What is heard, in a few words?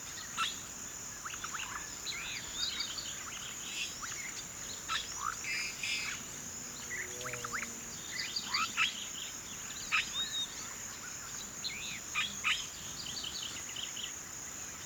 UNDISCOVERED Savannah open space bustling morning wilderness birds haven insec; insects songs